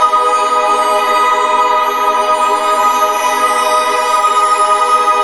song of discord base loop; LoL; sona

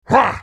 effort; Maokai

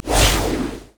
shock blast; LoL; jayce

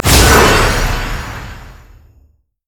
summonerspell base ghost; LoL

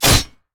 basic attack sword; rengar

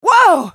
spell cast effort, en US

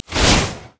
qspellbounce; LoL; ziggs snowday

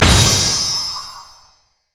powerchord oh; LoL; sona

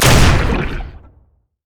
crit attack; Zac

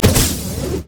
mana flare

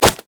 sad mummy bandage toss; LoL; amumu

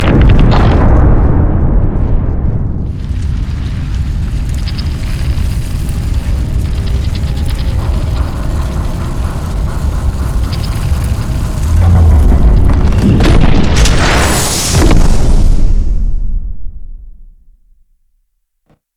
stair extend; odin

